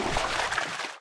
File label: slosh, footsteps